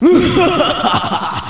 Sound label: haha